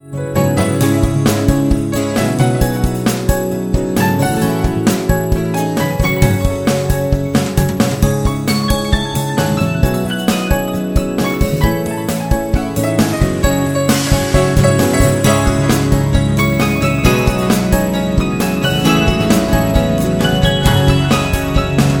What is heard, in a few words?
passion music